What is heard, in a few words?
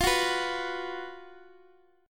B 5th F, chord; B5/F Chord {X X X 10 7 7} strummed